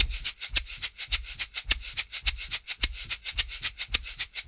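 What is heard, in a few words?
percussion, Roots Funk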